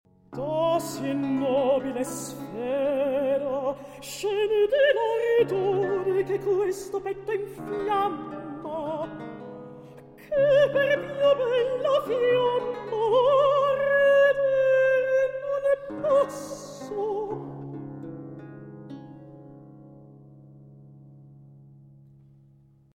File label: Recitativo; Teseo